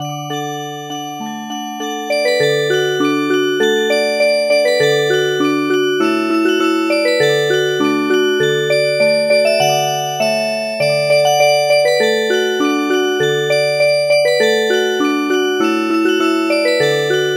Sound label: Music Box, traditional